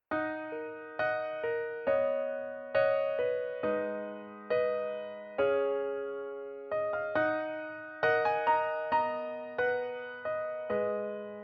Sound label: piano version